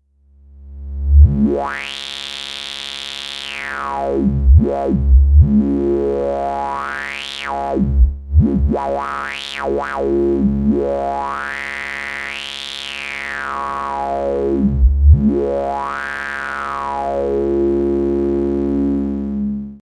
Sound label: a124 band pass, A-124 Wasp Filter (VCF 5); Band Pass Filter単独サウンド (波形はA-111矩形波を使用)